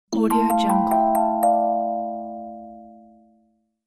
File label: kalimba message notification; نوتیفیکیشن پیام; interface sounds